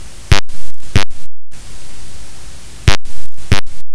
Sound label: Programing, Signalling Tones Elevator Phone